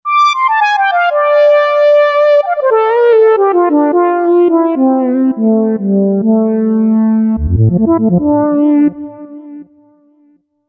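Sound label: Lead Singleline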